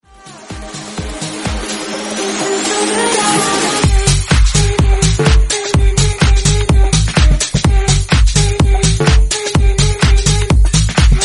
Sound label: 126bpm Eb